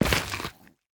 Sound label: step, netherrack; block; MagicRPG